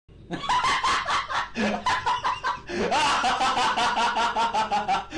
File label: popular laugh